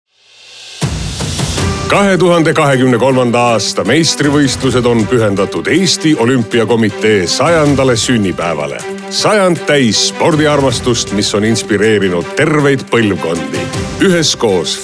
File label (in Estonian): kõll PIKK; EOK; EESTI